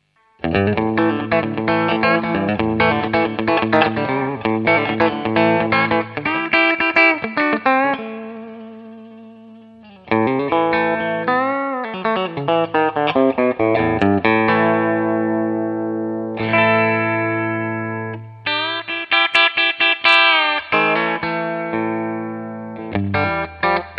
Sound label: 60 Bridge A, telecaster